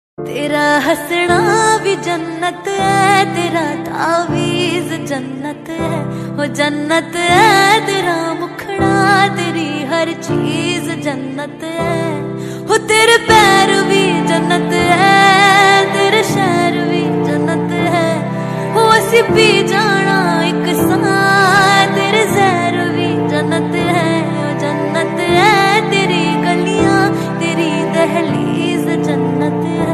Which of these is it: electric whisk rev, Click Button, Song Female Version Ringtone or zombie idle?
Song Female Version Ringtone